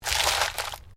ORGANIC TWIST; Various Organic Foley sounds; Knives; Combat

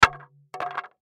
Impact Wood Object Hit Warble Drop Wobble; Sound Effects:Materials; Blastwave

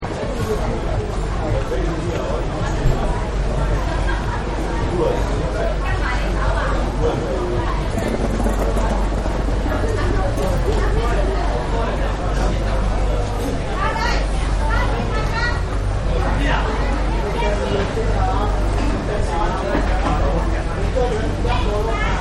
Chinatown Shops Voices; Cities; Backgrounds

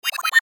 DIGITAL BLEEPS WAH; Beep; Multimedia; Blastwave

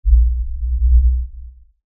Lfe Pulse Low Bass; Drone; Sound Design

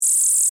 Insect Voice Chirp, Vocalization, Bug; Animal

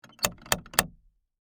Foley, Door, Knocker, Three, Times; Door Knocking; Doors; BlastWave

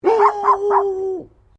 Animal Dogs 2 Labrador Poodle Barking; Various domestic dogs; Animals

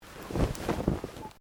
Clothing Shirt Cotton Move; Movemement sounds for various articles of clothing; Dressing; Human

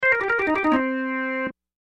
Organ Blues Riff; Keyboards; Blastwave

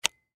Flashlight Switch Off; Plastic; Foley; Sound Effects:Electronics; SoundEffects-Blastwave

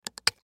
Water Bottle Cap Open Snap; Objects; Foley; Blastwave

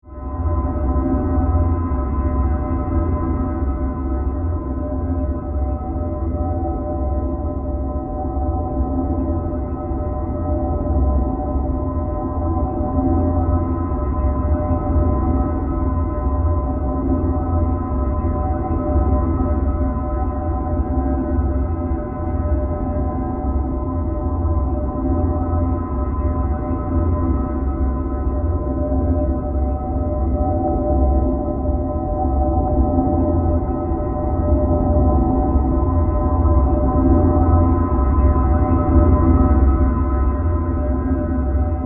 Power Drone Spaces, Scifi, Spaceship; Power, Drone, Spaceship, Science, Fiction; Spacecraft Sound Effects

